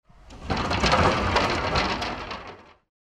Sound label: sliding door open; environmental